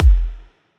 Kick, C

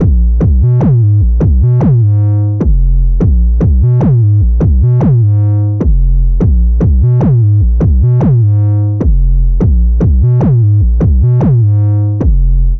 bass, 808, 150bpm; badman

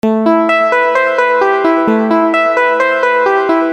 D trance

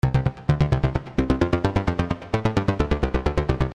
Synth

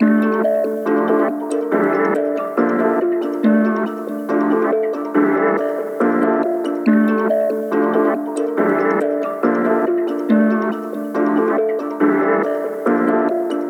Trap Synth Melody Loop 140BPM Am

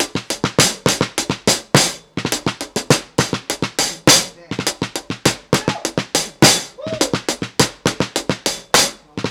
103 percussion loop snare hihat tape energy

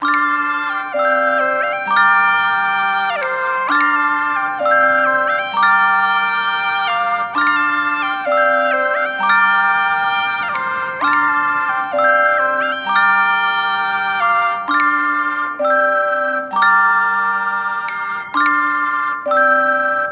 BPM 131

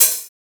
HAT; Boehmer

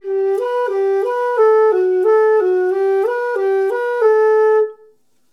110 G Soothing Solo Flute

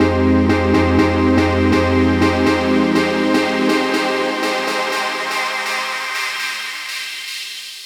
chord build 122 F

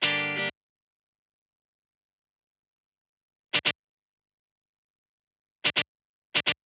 Guitar 128 Gmin

